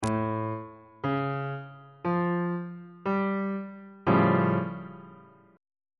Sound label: a augmented 7th chord on bass clef